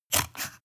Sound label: scissors cut paperboard